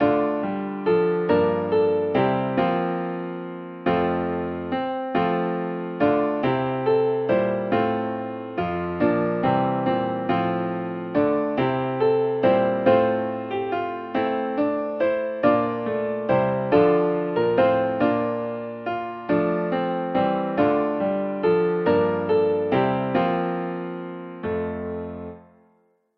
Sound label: accomp; O Lamb Of God